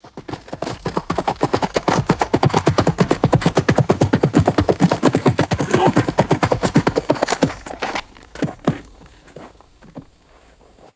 horse galloping and stopping on crunchy rock; footsteps

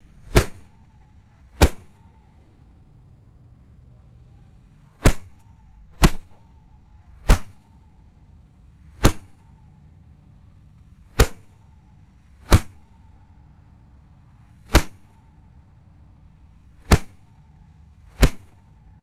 dull leathery fist impacts on football; game

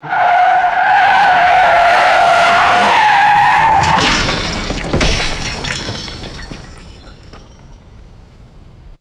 screeching skid and heavy metallic car crash; destruction

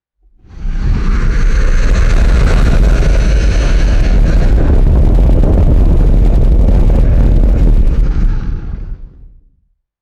deep rumbling rush of air

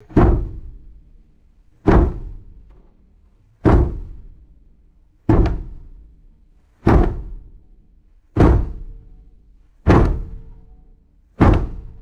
repeated hollow wooden chair bangs

